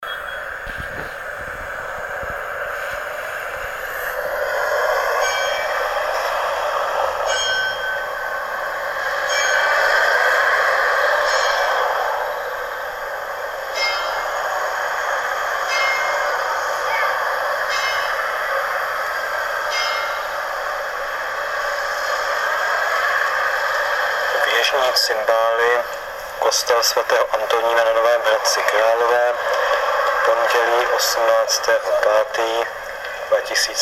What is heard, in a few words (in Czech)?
cimbaly; kos. sv. antonina, novy hradec k.